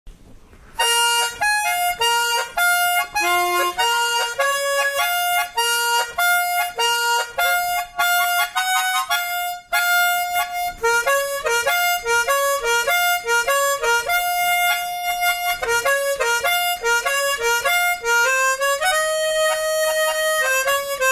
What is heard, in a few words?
Harmonica song